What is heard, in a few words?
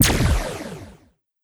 gambling press button; BARs 7s; wazdan